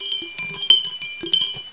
Windchime; Realmz 6.0.3; The Family Jewels

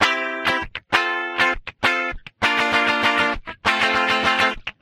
Guitar Loop; REAPER 0.79; GroovySample

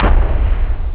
drums bass; OctaMED SoundStudio V1

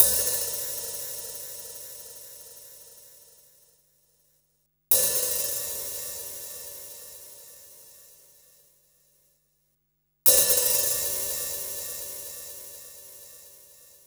Open Hats; The Loops; Hats shakes bells

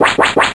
bonus; Ferazel's Wand Demo

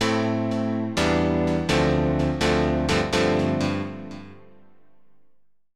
PIANO VOCAL 125 A; Keys

